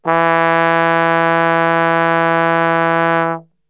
E 1 Brass Super Section; Best Service; TRMBSTRGHT; 90 s Sample CDs

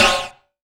Yamaha CS1x Drums

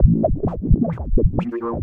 130 FRESH; USB Soundscan vol.20; Fresh Disco House I; AKAI; DISCO ADD

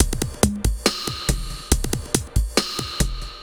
SloMo Beats, Loops 140 BPM

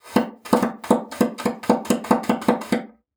BASSOON; Xsample VOL-6

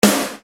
Snare Dr; Best Service; Ethnic Journey Sound Cube; EuroDance Essentials; Snares

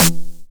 909 SD Tape Sat

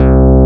JUNO, Synth Bass, Analog Bass

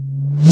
REV Dry Tom H

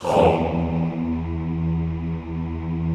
HOMMM E1, Classical Choir; Best Service

